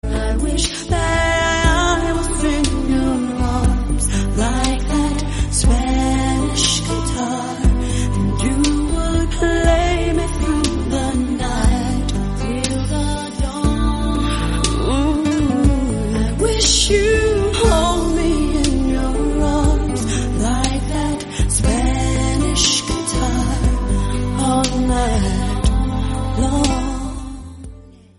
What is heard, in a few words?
SPANISH GUITAR RINGTONE